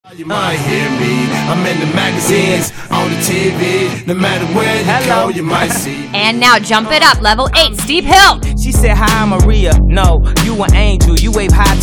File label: Hip Hop Class Sample; ICOD Hip Hop45